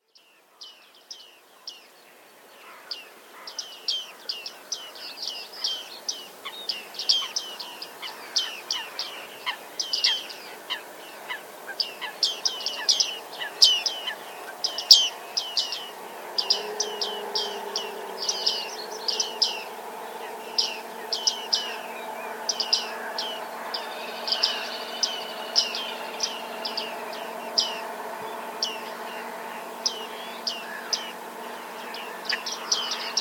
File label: bearded reedlings and a noisy tractor